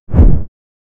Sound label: dashing sound, bassy